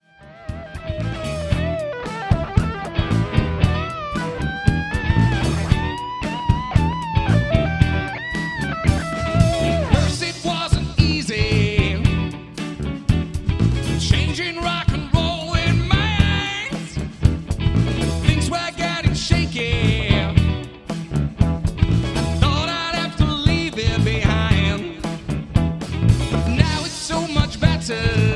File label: Funky Music